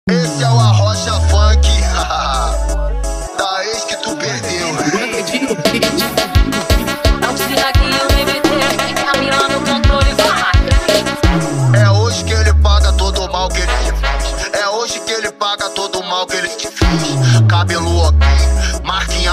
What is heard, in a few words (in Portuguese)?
som automotivo especial reggae e bregadeira